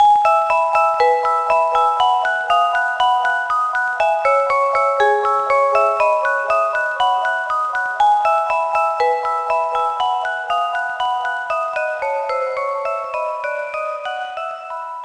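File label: background music end; My Frogger Toy Trials; Nintendo DS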